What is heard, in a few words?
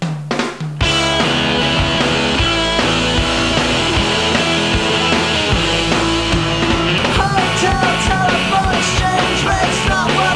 strings